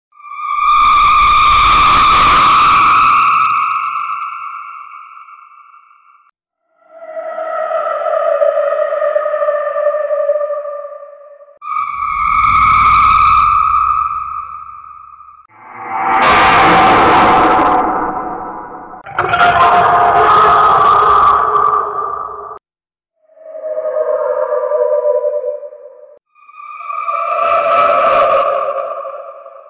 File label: suoni spaventosi effetti sonori horror scary sound horror sound